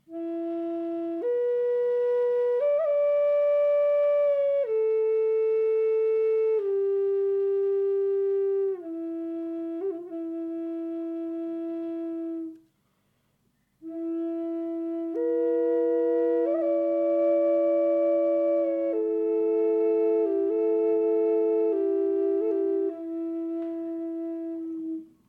Native Drone Flute Indianerfloete Doppelfloete F 432 ohne hall; walnuss; f 432hz

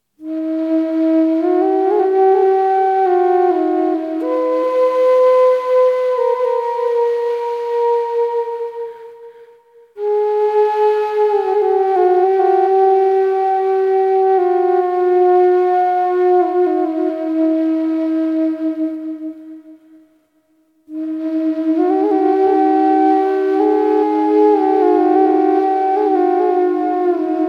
Aeolian E 432 mit reverb, drone flute aeolian tuning; mahagoni; e 432hz

